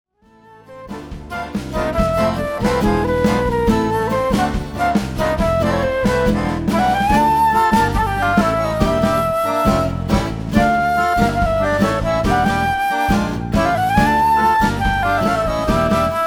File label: Flute